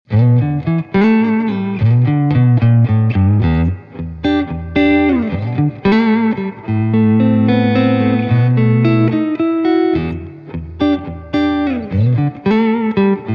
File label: Fender Strat Uni Vibe Vibe Fender Deluxe Reverb